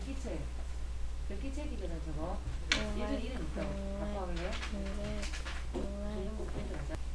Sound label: laugh